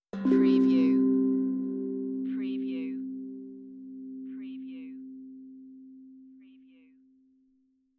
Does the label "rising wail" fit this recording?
No